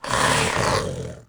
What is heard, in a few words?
serpent; tf2pets